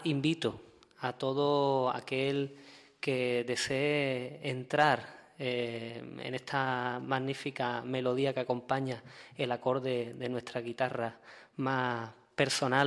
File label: Guitar; Almoraima Guitar Fest